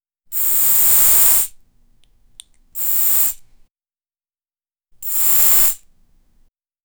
perfume

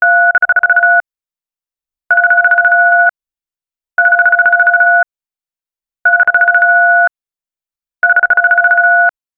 sound effect, telephone

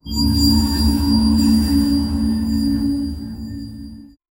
gentle ambient water soun, airy

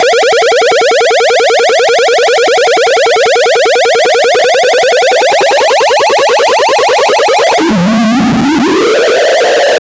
shooter

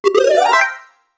jump sound effect to 2d

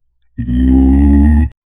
a young pokemon like wate, animal

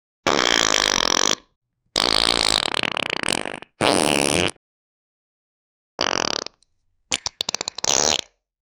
funny diarrhea fart

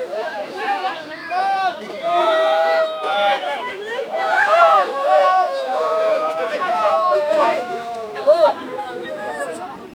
crowd shouting in surpris, marketplace

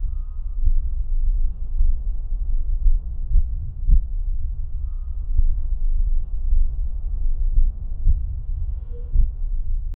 cinematic soundscape; corporate

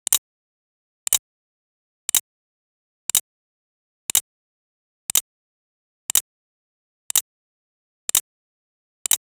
short cinematic, air burst

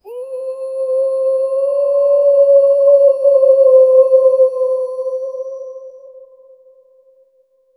a newborns first cry laye

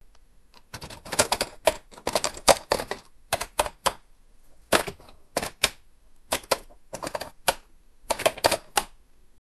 box, boxing

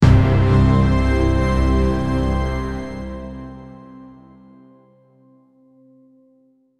a motivating track; motivational

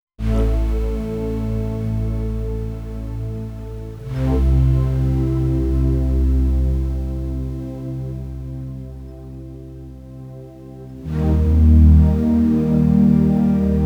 epic futuristic calm intro

